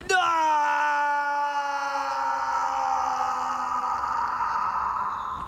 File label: death sound effect